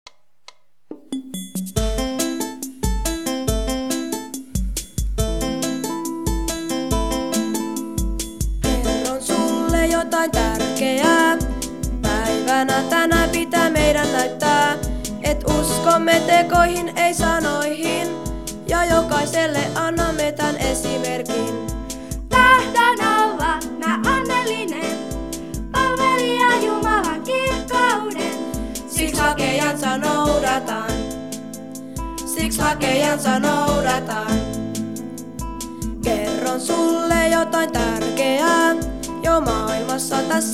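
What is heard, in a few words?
Song, Finnish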